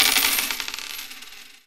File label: Cash Explosion Cash Win; CashBomb